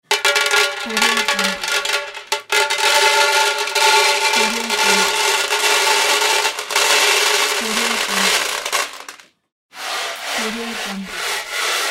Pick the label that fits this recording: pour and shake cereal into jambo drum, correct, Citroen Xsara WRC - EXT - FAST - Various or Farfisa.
pour and shake cereal into jambo drum